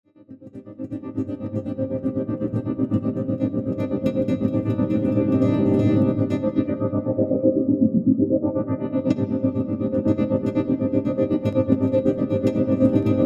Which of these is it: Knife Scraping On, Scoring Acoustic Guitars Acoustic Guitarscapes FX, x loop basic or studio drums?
Scoring Acoustic Guitars Acoustic Guitarscapes FX